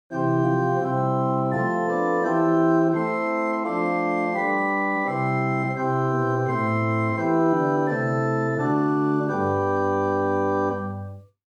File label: Gt Bourdon8 Spitzfloete4 Waldfloete2, organstops; First Baptist Riverside